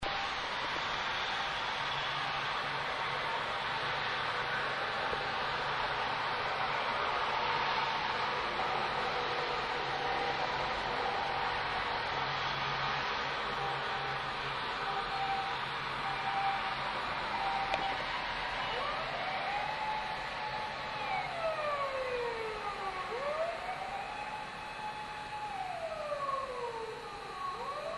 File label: siren; after pc on 15min